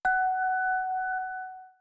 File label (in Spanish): campana exito